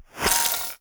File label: Glitch, noise